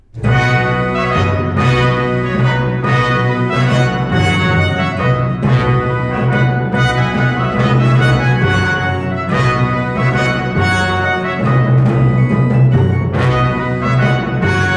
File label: barok trombone trumpet